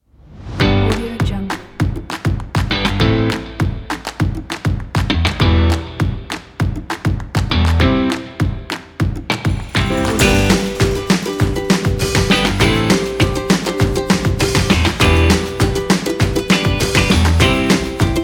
to be happy kit

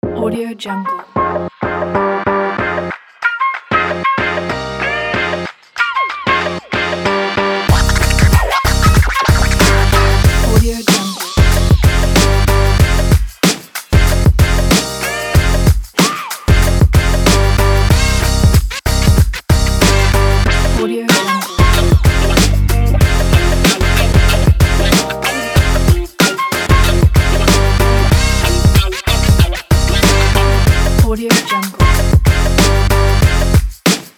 hiphop

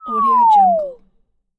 cartoon falling; nbkstudio

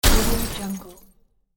car crash

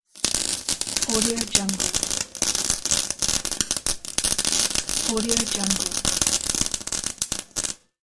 welding; debol757

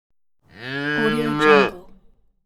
holy cow moo; hollywoodedge

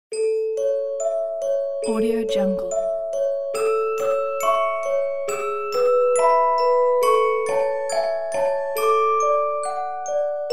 music box lullaby

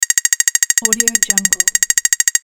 counting score

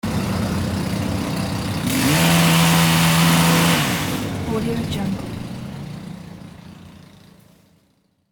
motorcycle engine

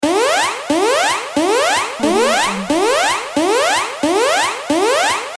danger alarm

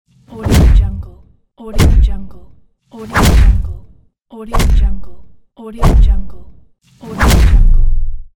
stamp

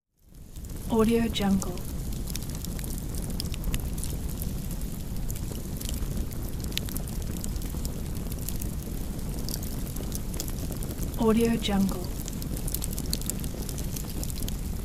fire

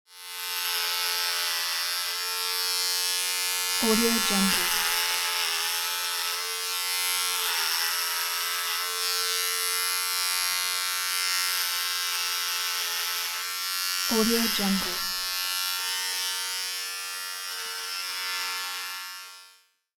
trimmer

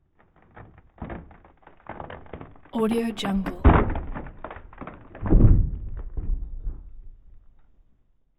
wood crack thick splintering bursts creaking; prosoundfx

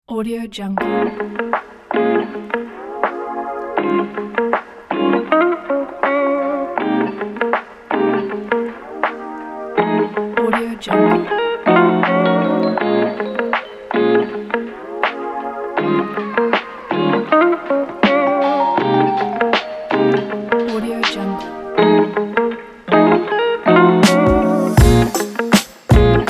neo soul beat rnb background

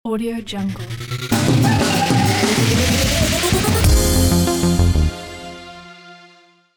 bursting logo

